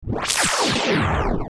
Stinger Charge Up Down, Stinger Short Warble Glitch; Production Element Imaging Element Accent Transition